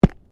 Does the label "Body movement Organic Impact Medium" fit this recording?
Yes